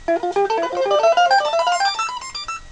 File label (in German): delay ton